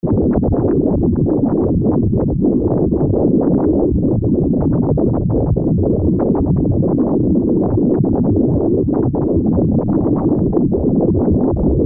basic bubbling atmo

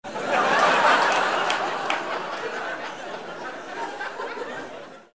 laugh long

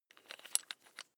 electric drill bit unfasten wrench turn

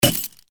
drinks beer bottle opening plopp

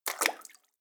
step water sneak in

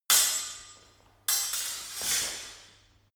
sword hit sword sequence

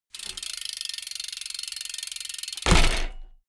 bmx bike ramp fly land

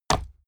horse step stone

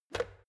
plastic water boiler lid off

